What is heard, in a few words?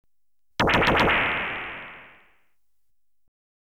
Stun Gun (Single Burst), Electronically Generated Sounds; BBC Sound Effects Library